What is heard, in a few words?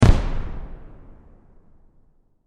Explosion Firework Boom Single; Firework Sound Effects; Fireworks (Sound Effects:Backgrounds); SFX Bible 6